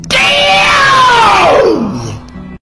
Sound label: damn pukeko